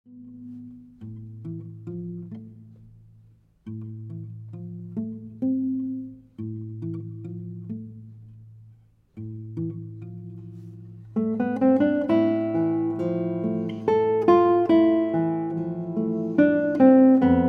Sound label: Guitar